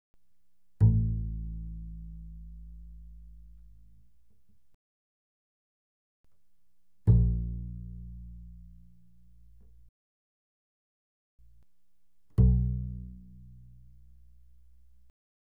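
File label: Bass pizzicato fortissimo sul A A1 B1, doublebass; Strings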